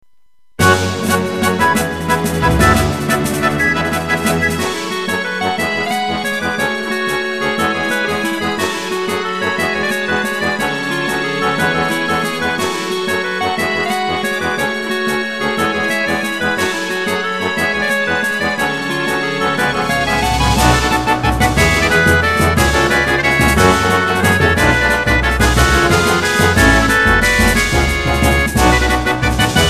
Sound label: fire